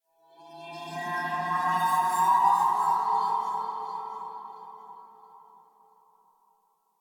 Texture Interior Medium, Ambience

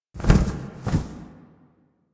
Owl Finisher Cycle; Duviri; Animals

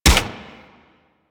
Swarm Rifle Shot

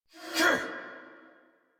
Attack Effort; Warframe; Dax Ashigaru; en

